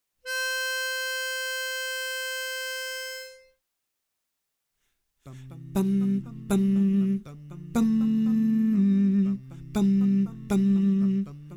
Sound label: Lead predom.